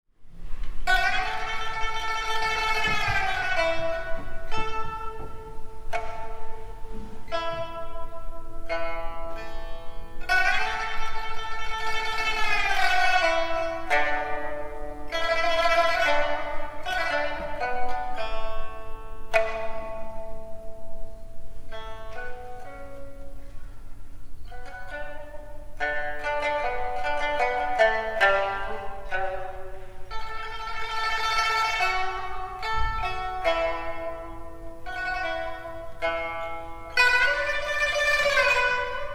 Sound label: pipa